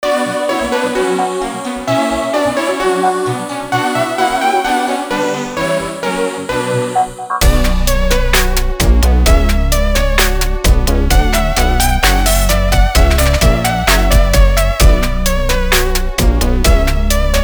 orchestral suite no.2 rondeau fragments; Bach’s Orchestral Suite No.2 (Rondeau Fragments) BWV 1067

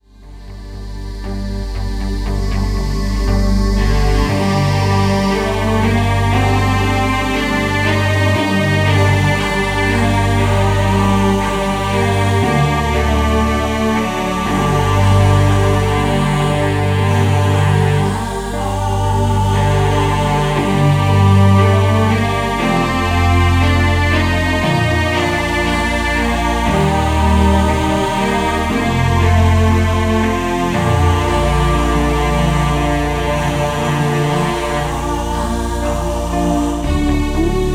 relax music; grieg

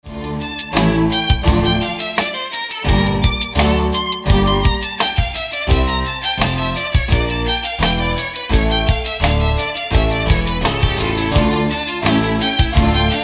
Concerto for Two Violins D Minor 1st Mov

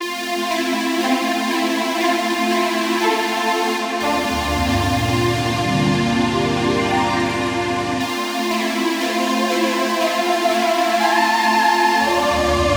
music for relaxation; schumann

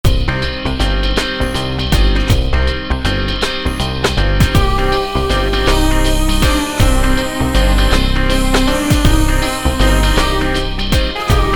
jazz waltz no.2 suite for variety orchestra; Shostakovich’s Jazz Waltz No.2 (Suite for Variety Orchestra)

